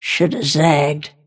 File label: last hit pain; snapfire